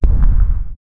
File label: a muffled soft sound of, asteroid